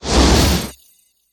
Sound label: Abomination Ranged Magical; Kilta